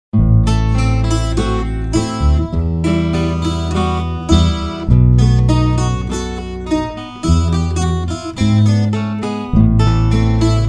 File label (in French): les deux guitares